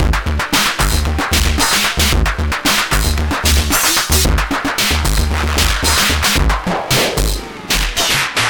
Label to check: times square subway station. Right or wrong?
Wrong